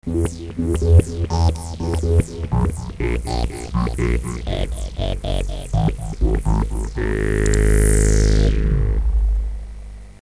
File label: xt bpf, waldorf